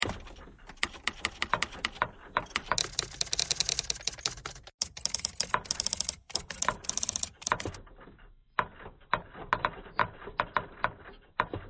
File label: typewriter thread simple